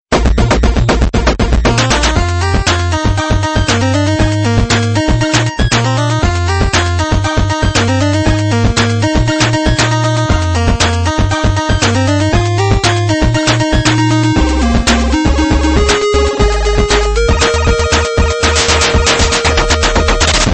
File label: 8bit